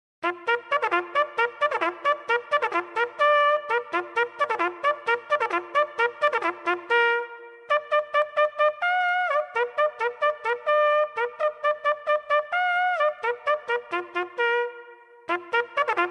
Musique Militaire Sonnerie du réveil Française

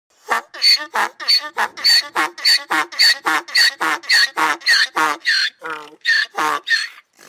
SONNERIE D ÂNE QUI BRAIE

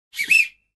sifflet sonnerie message

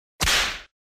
fouet

